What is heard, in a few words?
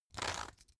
pepper mill slow soft; berufe; gastronomie; soundpool